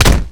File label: fists miss; Garrys Mod